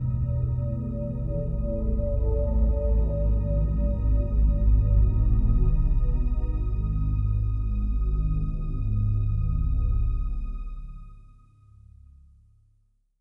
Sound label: spooky out, music